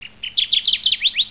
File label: warbler